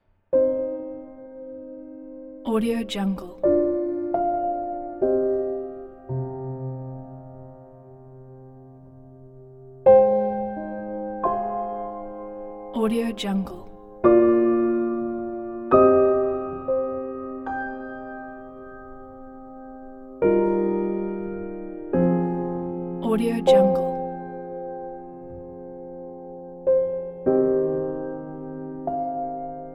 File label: simple sad piano